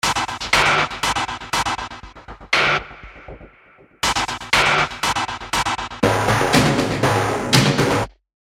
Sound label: High Loops; Loop Designer